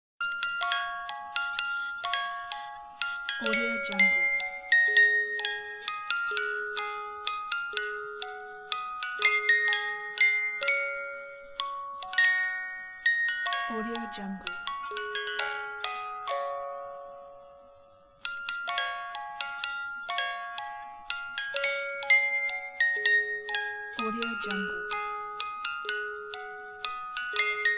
brahms lullaby

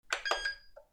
button beep

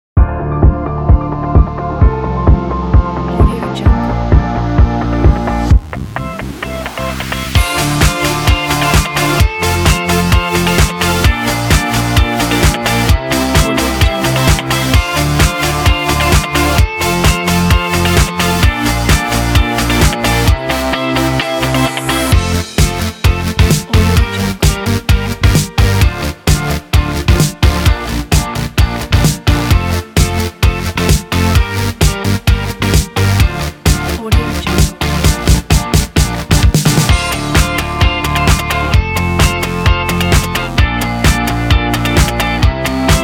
energetic pop kit

